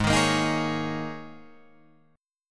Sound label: G sharp Suspended 2nd Suspended 4th, G sharp, down, Guitar, Drop D